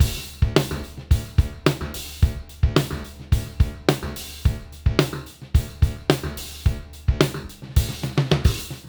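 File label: 108 bpm, beat loops; Zero-G; Monster Beats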